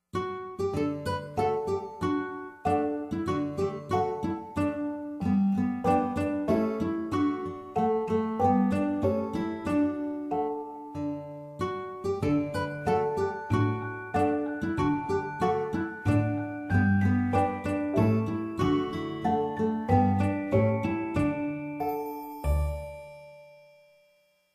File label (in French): Instrumentale